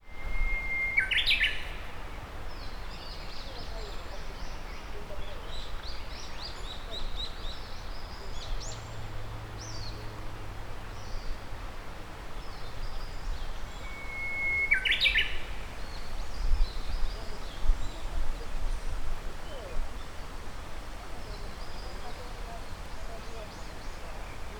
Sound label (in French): oiseaux; oiseaux arima